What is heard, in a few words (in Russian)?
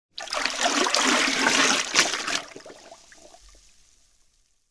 shvabra iz vedra na pol